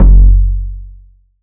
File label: 808 Bass Quick F#3; Slate 808 Deep Dive; SD Signature 808s